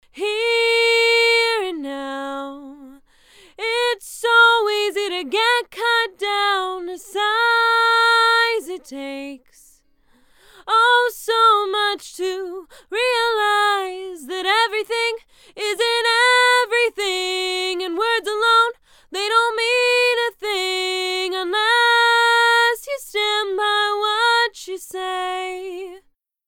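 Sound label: Female Vocal, Manley mic; Gold; manley mic comparison gold silver refc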